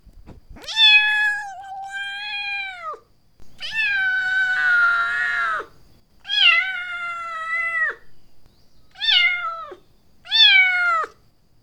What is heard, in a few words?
meowing